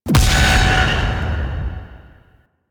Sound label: Queens chamber braid destroy; Warframe; Ambience; The War Within